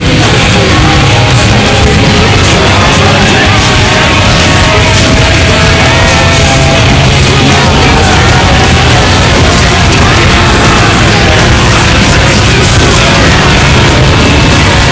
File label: MTV noise; 130 Кб, которые помогут достать соседей